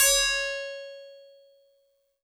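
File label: Tubular Bells DX100 C sharp 0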